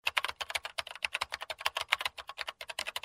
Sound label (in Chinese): 系统出现打字音